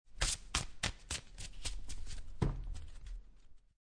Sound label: Playing Cards Shuffling Cards In Hand Then Tap Deck On Table